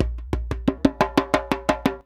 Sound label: 119 DJEMBE, Modern Percussion Loops; USB Soundscan vol.56; AKAI